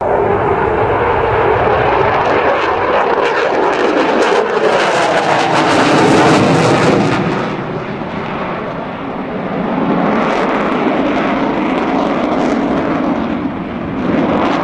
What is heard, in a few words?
Eurofighter